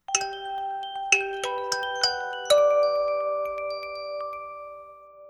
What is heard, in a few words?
Samsung Galaxy S4 Mini LaFleur Startup